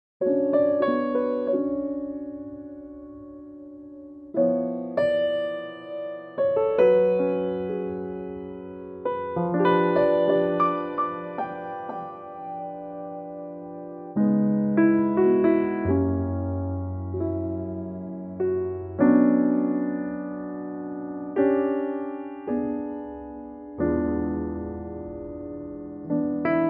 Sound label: piano; A Pianotrak; key - A; vocal range - D to E